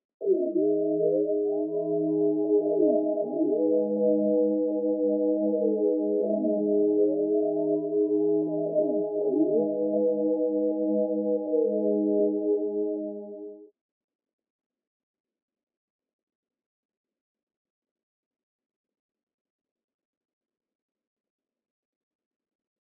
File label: 160 BPM G# Min Pad; Melody; Loop Stems; Cymatics; 2022 Melody Collection Platinum Expansion - Pt 2; Stories